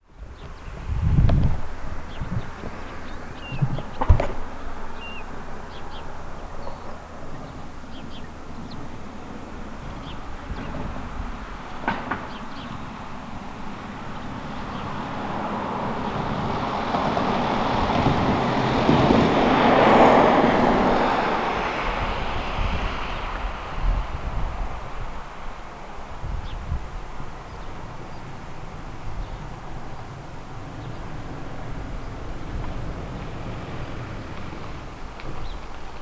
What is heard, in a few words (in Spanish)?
AMBIENTE CERRO MASATRIGO